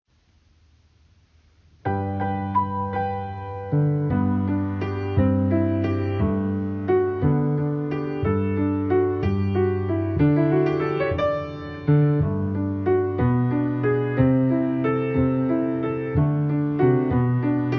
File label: piano